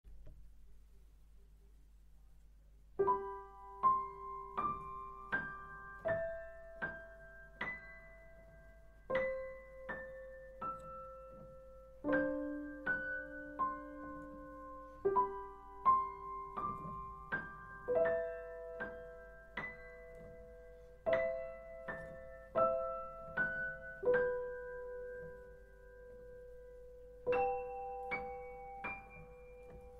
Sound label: lullaby